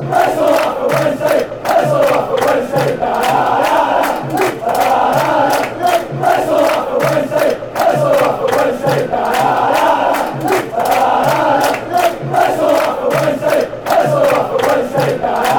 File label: lets all laugh at wednesday, chants